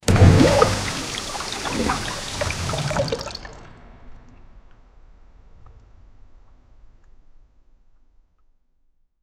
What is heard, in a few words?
water shock, aquatic